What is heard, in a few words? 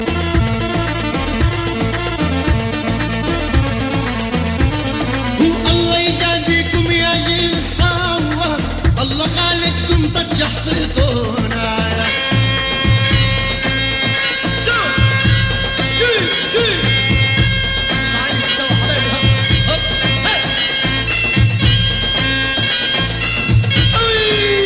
dabka, ringtones; alternative